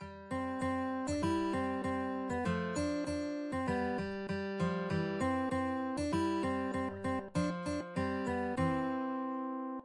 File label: muffin man guitar